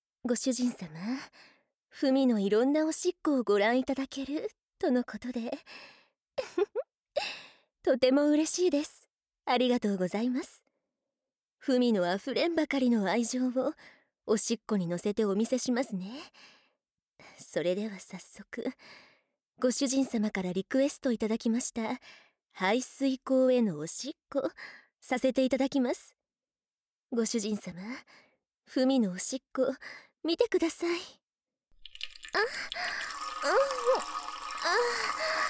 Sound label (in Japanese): 排水溝 おしっこ; 文のおしっこコレクション